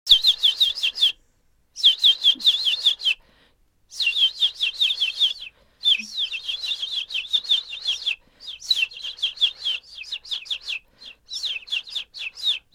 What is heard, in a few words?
Hummingbird Whistler Reprise